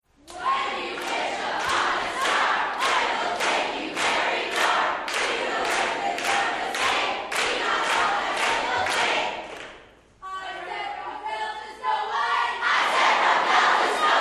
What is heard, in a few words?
Cheer